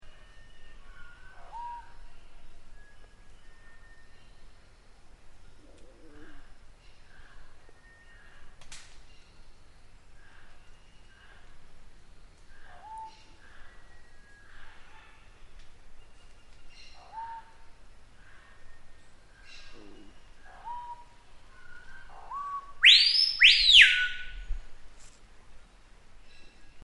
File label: Screaming Piha, Bello Horizonte